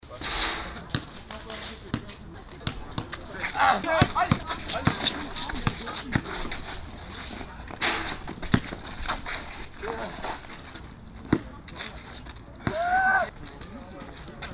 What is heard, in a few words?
basketball match atmo